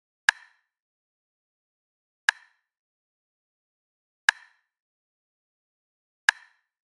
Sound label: Rim